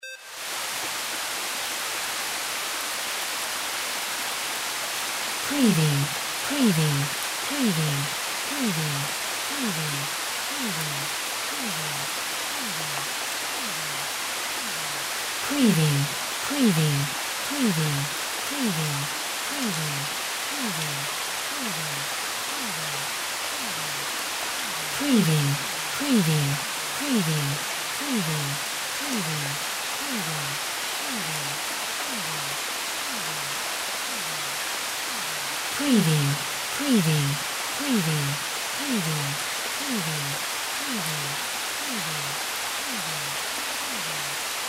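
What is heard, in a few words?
weather rain constant; windy beach audio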